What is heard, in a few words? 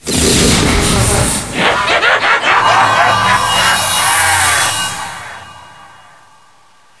vox laugh left